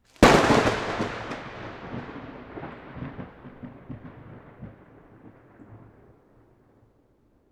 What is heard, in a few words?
Close Thunder Crack; Mid-Side